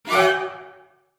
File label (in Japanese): 戦慄が走る音